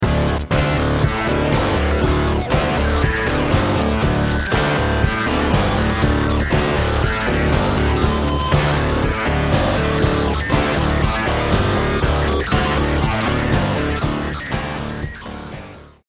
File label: TESTSOUND Oscimaker